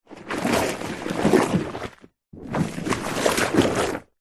household soapy foam bubbles bath water short movement hand; zapsplat